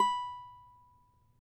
CAVAQUINHO B, Guitars; Spectrasonics; Hans Zimmer Guitars VOL-2

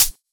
Closed HH

